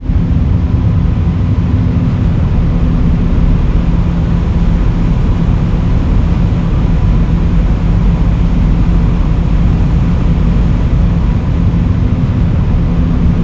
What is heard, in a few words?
ship engines; World Of Warships